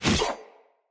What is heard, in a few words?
Axe Whoosh; Weapons; Enemy; Axes; Glades Axe